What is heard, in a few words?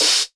Crash, Cymbal; Ableton Live 12 Lite; Core Library; Drums